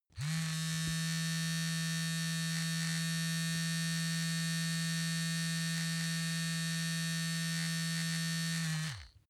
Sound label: razor smooth long